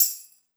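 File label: percussion, 160 BPM, drum & bass; Ueberschall; Drum & Bass Flash Trackz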